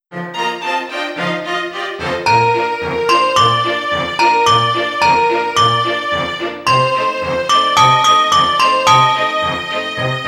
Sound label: Handbells